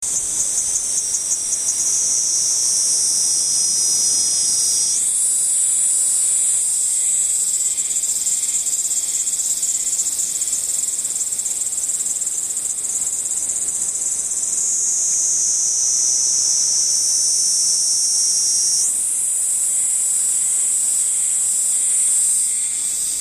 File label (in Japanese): niinii zemi